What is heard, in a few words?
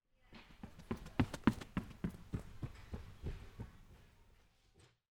girls running